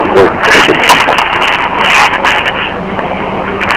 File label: axe